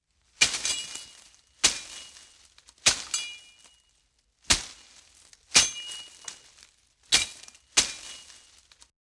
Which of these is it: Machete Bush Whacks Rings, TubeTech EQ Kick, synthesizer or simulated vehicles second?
Machete Bush Whacks Rings